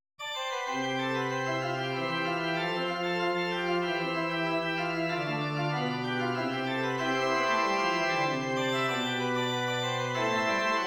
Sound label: Prelude mid; John Blow